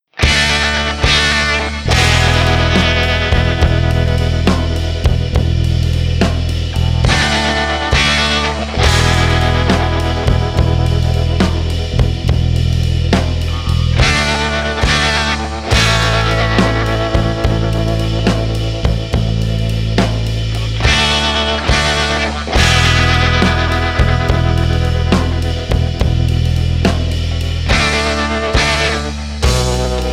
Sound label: rumble